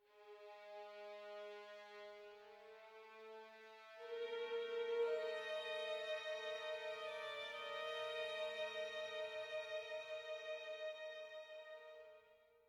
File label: ambiant dark